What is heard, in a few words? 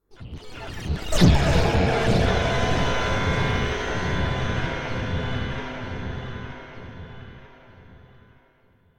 Stinger Swell 5 Seconds